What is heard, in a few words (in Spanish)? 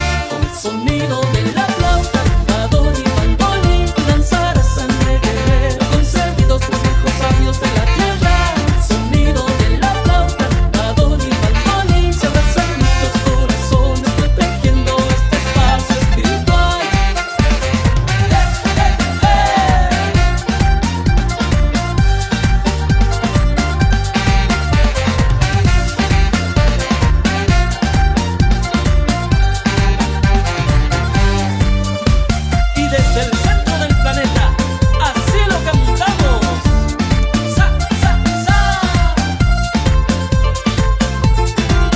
escala andina